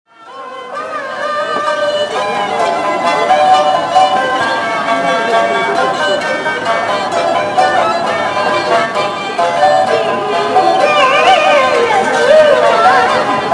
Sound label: Market Music; thursday market day